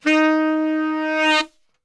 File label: D#2 SAX, Brass Super Section; Best Service